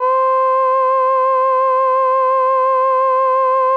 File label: C4, SYNTH WAVES; Music Store Sound Service; Einstein